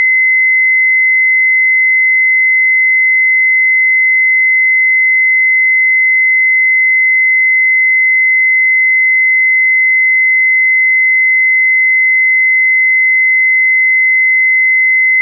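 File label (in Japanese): 2048 Hz, 信号音; 8 オクターブ上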